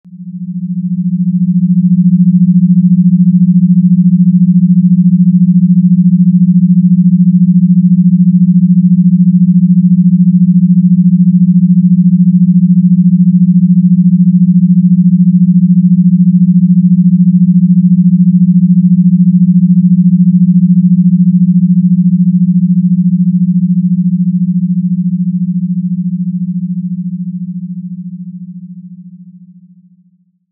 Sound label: Sample Beta Binaural